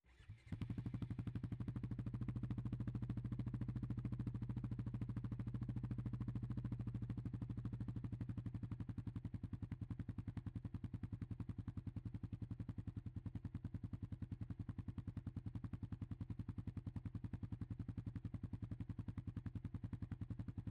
Textron Alterra 500 - ONBRD - IDLE - Start Steady Blips Off - EXHAUST; BETA58